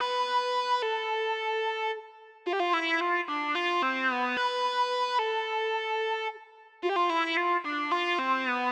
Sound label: Latin HipHop Pad Amin, Synth; Digidesign; Pro Tools Essential Loops